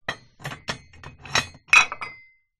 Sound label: Weights